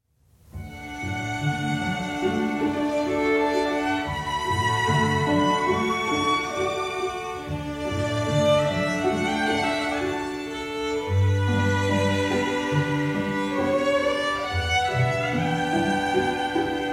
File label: Meditation Violins